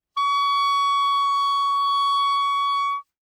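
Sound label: Soprano Saxophone non vibrato ff D4; Woodwinds; Bb Soprano Saxophone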